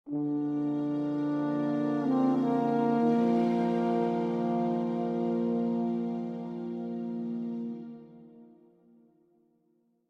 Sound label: Location Jingle New Sub Region; thehunter call of the wild gamerip 2017; GFL Location Jingle 06